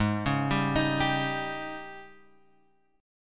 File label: Ab Major 7th Suspended 4th Ab Guitar Open G; 6,6,5,8,8,x; down